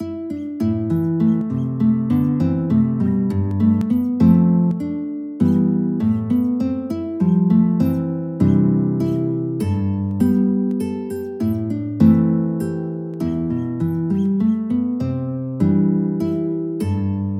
guitar

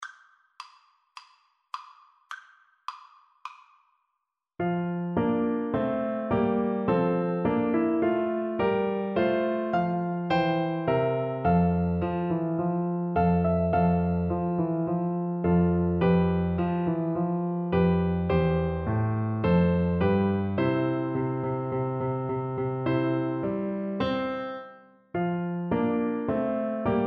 march in d, Trumpet; bach; bwv anh 122

